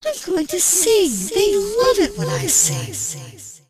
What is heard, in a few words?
siren voice; kfsounds; css